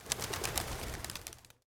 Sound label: Pigeon Wings